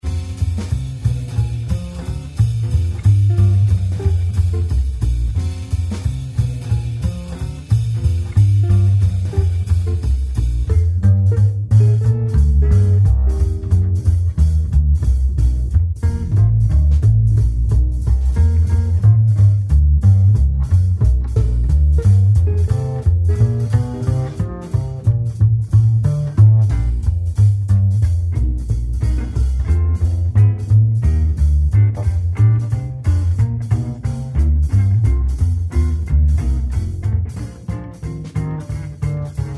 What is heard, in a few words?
JAZZ A PAU DRUMS